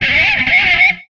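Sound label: Music Guitar Pick Scrape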